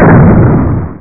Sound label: BOOM; ReaderGames; TankedUp